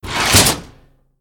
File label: Sphere Advance Switch Up; moul